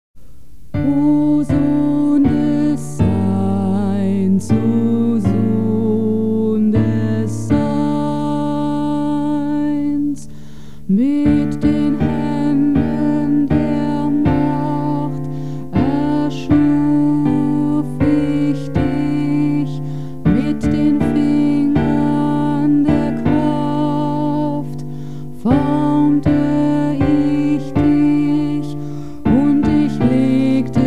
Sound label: bass